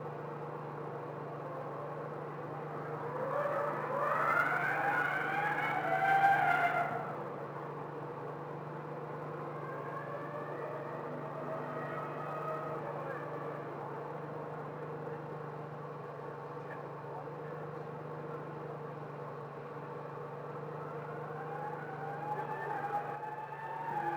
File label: F1 driveby; ambience; Project Gotham Racing 2